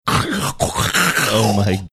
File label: making sleep apneaic snoring